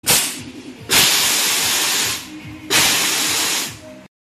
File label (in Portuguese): fumaca